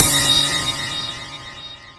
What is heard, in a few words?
Stab Surprise; FREE SFX PACK VOL 2